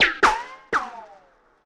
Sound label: Ricochet & Whiz By Multiple